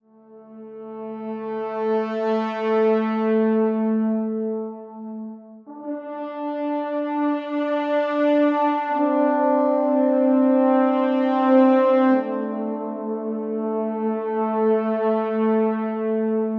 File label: Camp Brass B